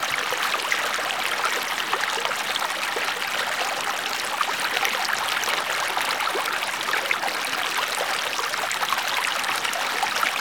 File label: Stream Water, Medium Flow; lilesound